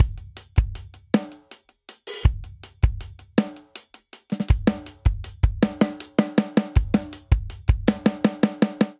Straight Six Rock Extra Verse 6/8 80 bpm, Drum; Ableton; Drum Booth

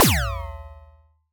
Alien FM Perc Short; Ableton; Noise Garden - Augmented Percussion; Alien FM Perc Vol. 1

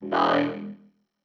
120 Neo-Wave Vocoder

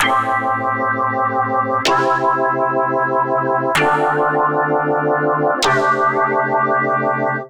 Jazz Chords A Minor 128 bpm; MIDI Clips; Ableton; Factory Packs; Skitter and Step; Jazz Lyfe

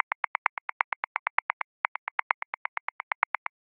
Hip Hop Funky; Grooves; Ableton; Factory Packs

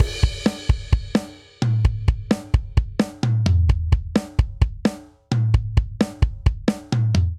Driving Rock Verse 130 bpm, Drum; 4 4; MIDI Clips; Ableton; Factory Packs; Drum Booth

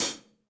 Hihat Closed Session Dry; Drum Hits; Session Drums Studio; MPE Hits

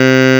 vvox, Digital Synths; Ableton Foundation FreePacks

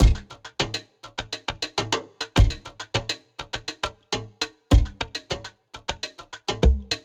Groove 102 bpm; MIDI Clips; Drums; MPE Zitub; Ableton; Factory Packs; Lost and Found

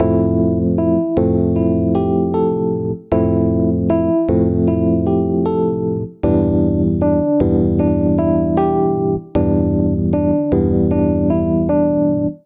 Piano C Major 77 bpm; Accompaniment; Tonal; MIDI Clips; TransAm; Drive and Glow; Ableton; Factory Packs

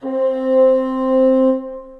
Bassoon Section Legato Vibrato; Ableton; Orchestral Woodwinds

